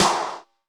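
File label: Snr Lo Pop Shot, snare; Alesis D4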